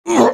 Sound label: hit; NWNDS; nwnds audio